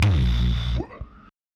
BD, DRUMS; jockbox; Polyend; Legowelt

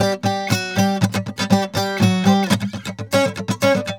GUITAR SALSA

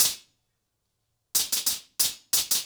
90BPM, ACOUSTIC DRUMS

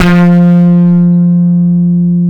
FUZZ F 4, bass; Spectrasonics; Bass Legends

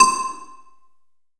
BELL, PERCUSSION; AKAI S6000 CD-ROM - Volume 5

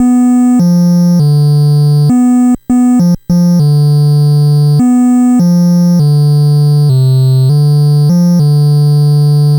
NES Bass, chiptune

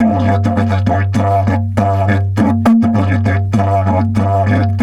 DIDGE 100BPM, Didgeridoo

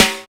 Light Snare; Snares

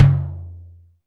LO, TOMS; Back In Time Records; Big Bang

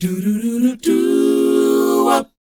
DOWOP D#; DOO WOP; ILIO; Vocal Planet

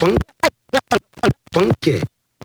Scratch

